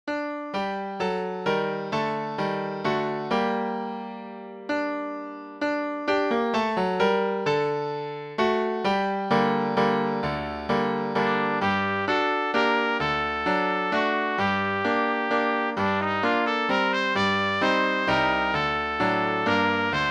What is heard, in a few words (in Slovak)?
Princezná na hrášku - pre trúbku a klavír